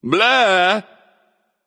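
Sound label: tongue, non-verbal; smite; voicepack; merlin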